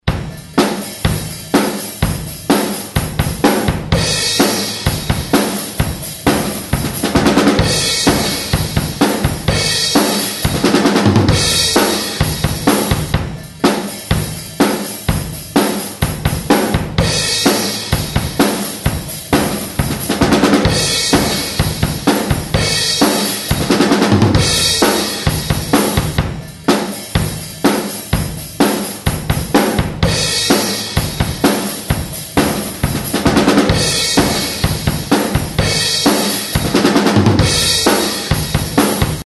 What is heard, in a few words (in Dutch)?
strak drummetje